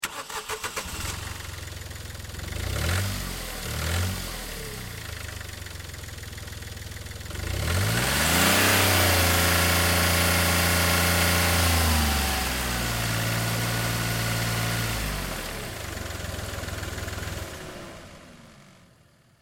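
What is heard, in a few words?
Car Smart Car Onboard Revs; 2005 Smartcar Fortwo; BigRoomSound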